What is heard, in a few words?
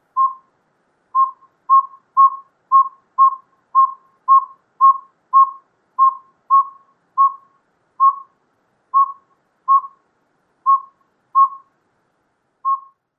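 toot series, bird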